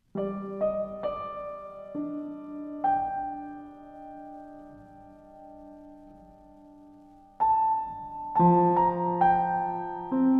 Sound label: end music